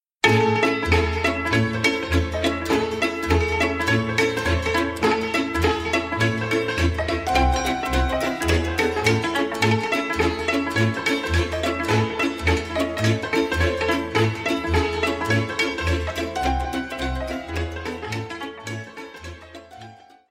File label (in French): Calusul - Roumanie